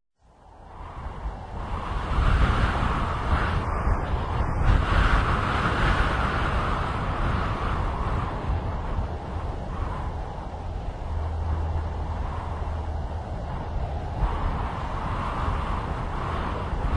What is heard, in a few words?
air